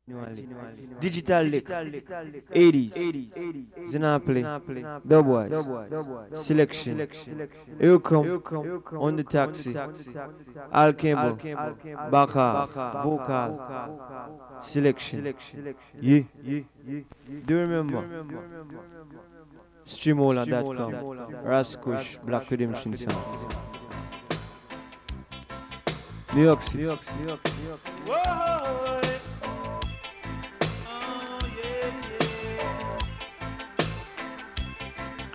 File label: reggae vibes